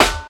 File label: crisp snare sound f key; snare drum samples